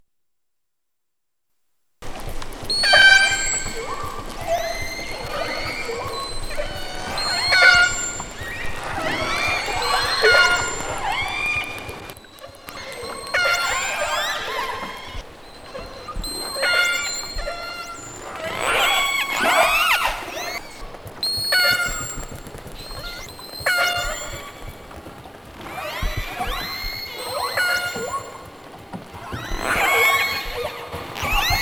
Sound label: Southern resident killer whales; Lance Barrett-Lennard Vancouver Aquarium